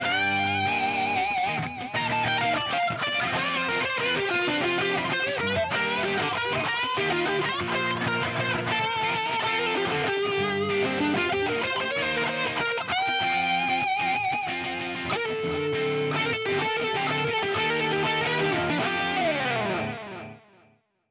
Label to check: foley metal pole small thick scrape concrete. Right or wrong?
Wrong